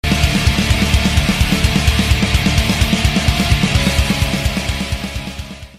Blast Beat

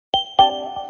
Tetris Nokia Tone

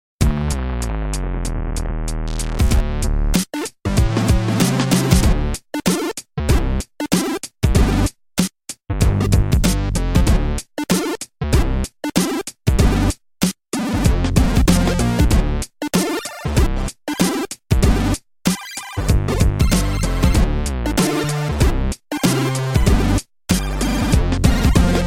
Explode Arena

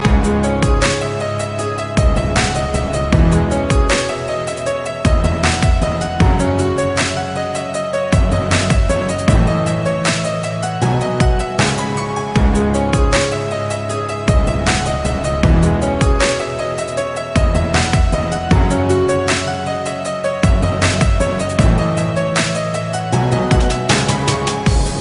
Piano Dubstep

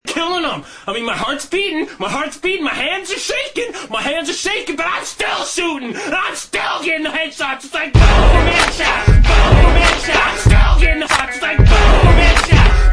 headshot

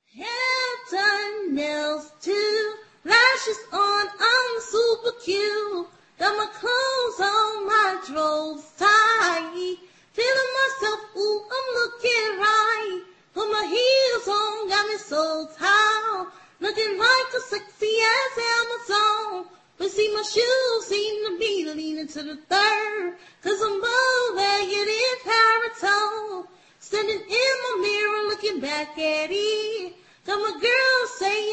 singing acapella

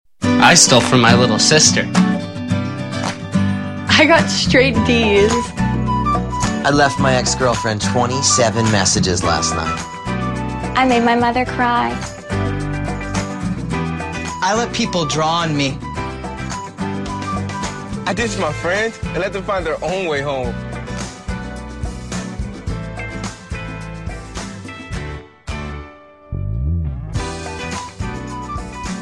Achievement Unlocked

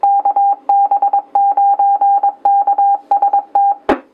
HAM Radio standby

